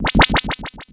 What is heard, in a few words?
collect